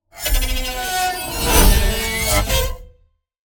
Metal wires dissonant phrase

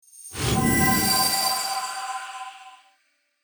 Magic poof sparkle whimsical; fairy

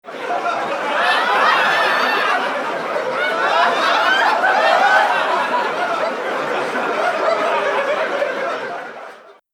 Audience laughing loudly; Crowd

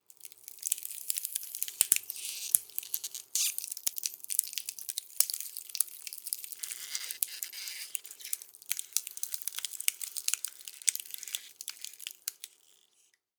Clean ice cracks

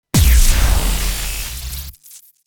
EMP Shockwave glitchy electric

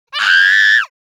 Little girl angry shout

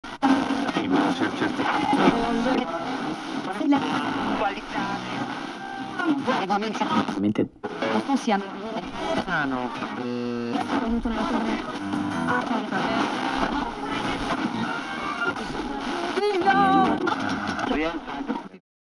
Radio FM tuning vintage recording

